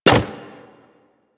Hyena Micro Step Default, Footsteps; Warframe

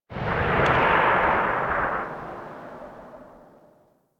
Rifle Tail; Weapons; Warframe; Thanotech

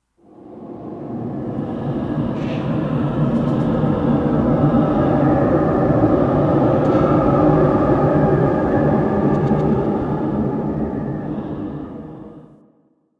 Ghost Wind; Dagath; Warframe; Ambience; Clan Dojo; Dojo Misc

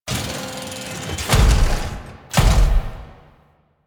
Void Rig Spawn Step Down; Warframe; Entrati; Necramech Hack

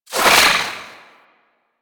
Bow Whizz By, Weapons; Warframe; Corpus

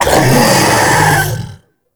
Infested Death Vocal, Enemies; Warframe; Femur

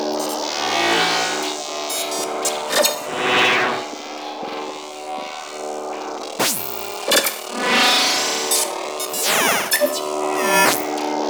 Gas City Holo Screen Loop; Ambience; Props; Warframe; Corpus Gas City; Props Remaster

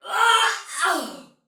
Death Soldier Female, Battle Barks; Dialog; Sinecure

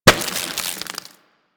Devour Enemy Bite; Warframe; Mummy

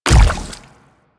Step Water, Footsteps; Enemies; Warframe; Infested; Grey Strain; Splitter

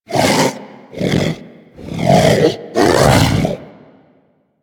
Predator Vocal Captured Idle, Hunting Critters; Warframe

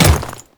Ice Step, Footsteps; Royal Guard

